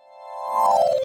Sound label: achievement back; brackeys-12